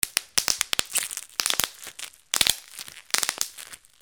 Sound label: BREAKING